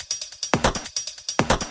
Loop 140 BPM; vengeance essential club sounds-3

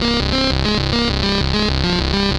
Arp C Line, ARP Odyssey; Lines; synth; musicradar-synth-samples

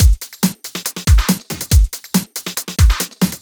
Breakbeat 140 BPM

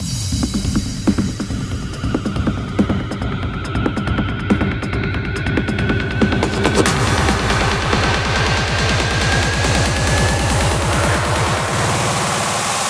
140BPM 8Bars, Hands Up Trance Fills; Abyss; Vengeance Samples

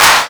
Clap; Vengeance Samples; VEC1 Claps

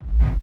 BD Gate FX, Bassdrums; Vengeance Samples

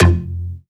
Synth Cuts; Vengeance Samples; VES1 Instruments

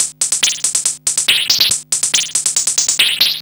Arcade Riffs

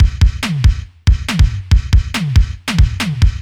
Breakbeat 140 BPM; Loops; Vengeance Samples

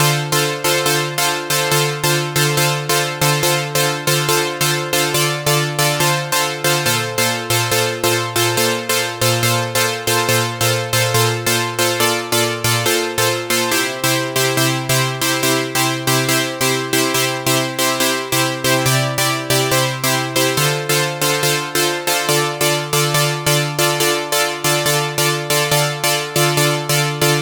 Pluckchord DRY 140BPM, D minor; Melody & Synth; Vengeance Samples

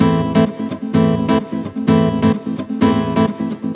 Nylon Guitar - A min, 128BPM; Vengeance Samples; Special Sounds; Nylon Guitar Kit

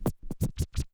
Cuts, Vinyl Record Samples

